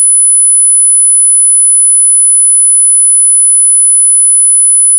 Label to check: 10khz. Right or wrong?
Right